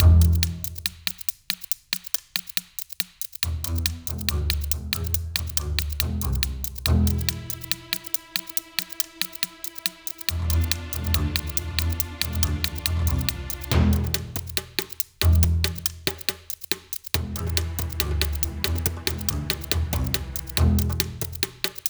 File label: LowTense Combat loop